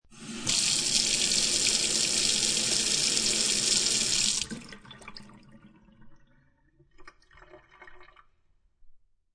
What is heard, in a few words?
Kitchen Sink Turn On Water Turn Off Water Flows Down Drain; Water Sound Effects